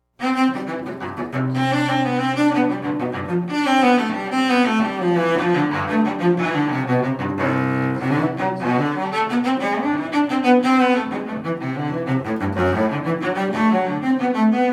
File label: Suite 3 Courante, cello